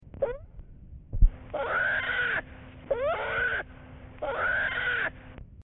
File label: scream, bear sounds